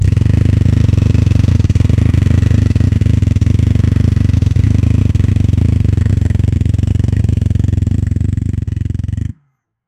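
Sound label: deep harley davidson v twin, motorcycle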